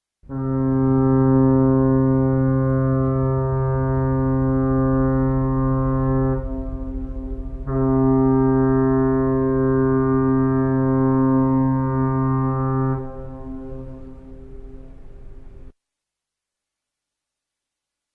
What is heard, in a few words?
fog horn